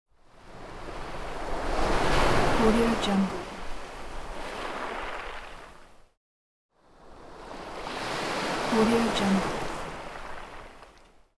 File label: wave